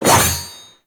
Sword Whoosh; Warframe; Teshin; Weapons

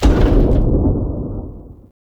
Step E; Erra; Sentient Throne Room Gameplay

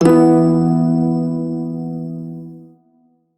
Shawzin Chromatic Chord; Warframe; Prime Shawzin

